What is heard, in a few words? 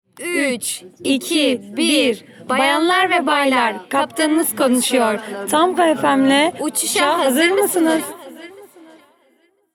Jingle; Europa